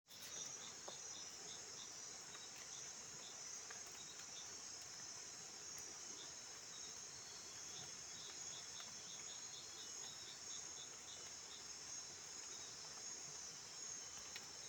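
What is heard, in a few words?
Chiasmocleis jacki, frog; guyane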